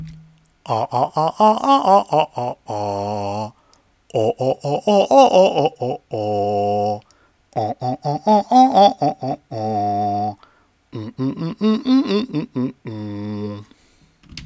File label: singing; vowels